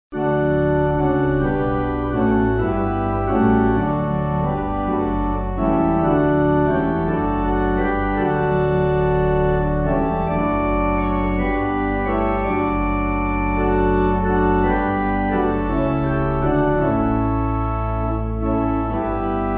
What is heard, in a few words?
Pipe; Theres A Friend